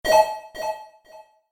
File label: pickup